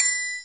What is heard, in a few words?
TRI, triangles; Univers Sons; Basicussions